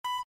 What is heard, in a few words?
garmin beep